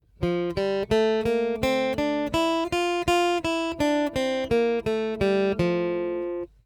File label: F Major Scale, guitar